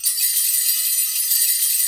BEL, Orchestral Perc; Roland LCDP03

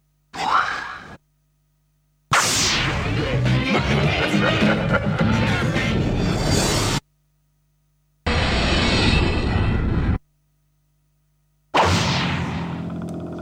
Sound Effects; Kniteforce; 1000 Samples Volume 1

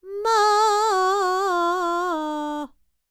TURKISH, Vocal World Voices NEAR EAST; ILIO; Vocal Planet VOL-5

